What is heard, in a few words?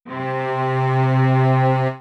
c cello